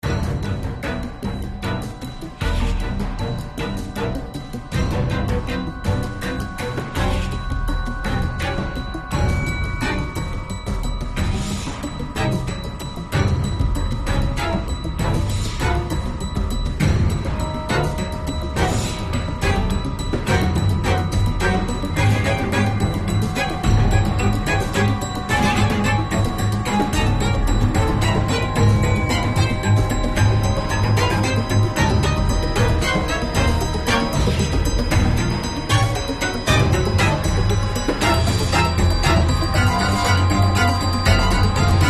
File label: Action